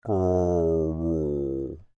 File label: Creature Speak Groan Med, Human; Groaning; BigRoomSound